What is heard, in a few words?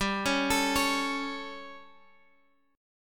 G Suspended 2nd Flat 5th G, Guitar, Drop D; chordshape; down